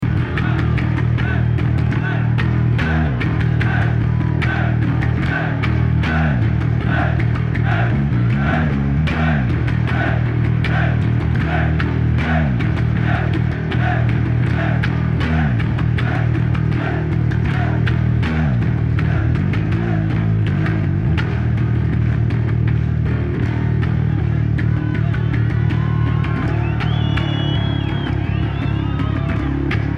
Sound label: Bass Solo